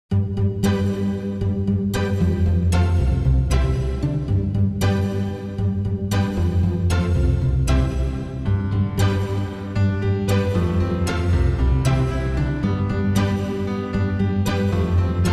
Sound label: piano ambience